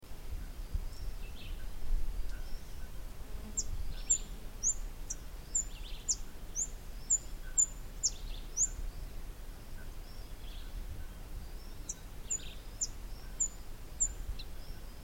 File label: bay chested warbling finch